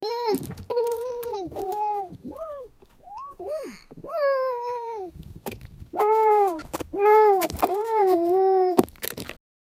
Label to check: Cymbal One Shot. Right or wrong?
Wrong